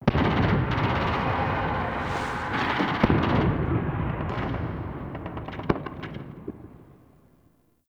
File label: Ambient Distant Infested Explosions; Nineteen Ninety Nine